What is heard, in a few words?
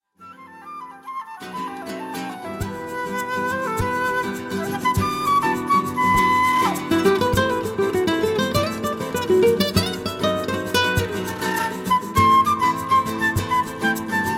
instrumental